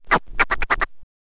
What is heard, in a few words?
scratch kit, vinyl